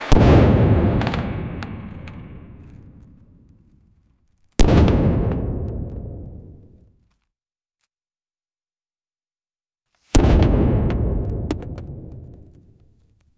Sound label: trombone player playing; instruments